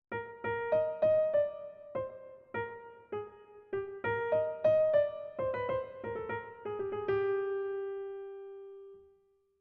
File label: motives; 06 - E-flat Major 3/4